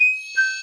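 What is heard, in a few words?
reminder; Outlk98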